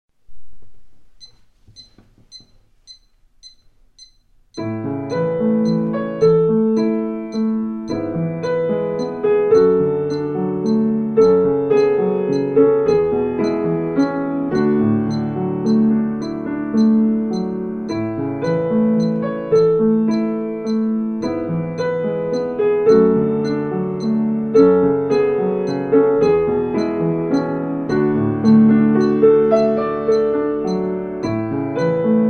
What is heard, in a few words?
Piano